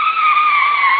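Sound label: skid tarmac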